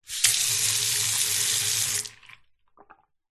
household bathroom tap faucet turn on run off short empty no plug